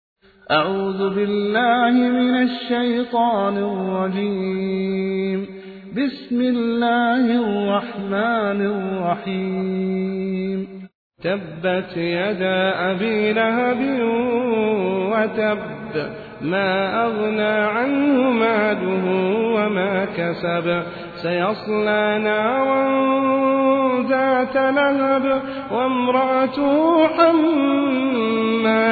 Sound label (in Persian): سوره مسد; العصیمی